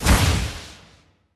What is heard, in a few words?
SFX Power Soulimpact Impact